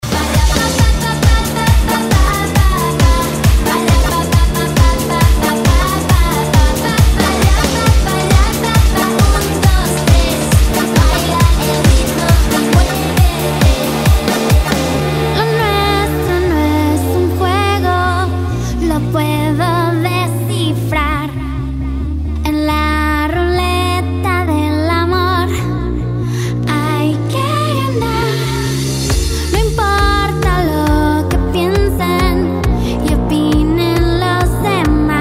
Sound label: electro house e dance